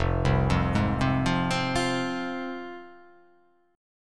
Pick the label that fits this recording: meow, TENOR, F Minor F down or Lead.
F Minor F down